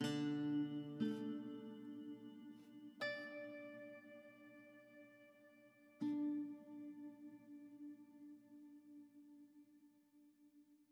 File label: Harp